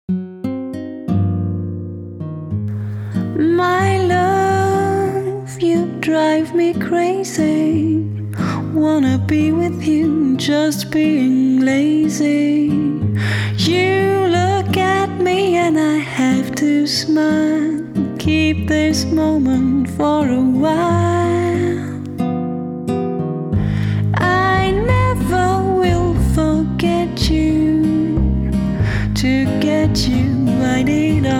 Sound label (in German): Gesang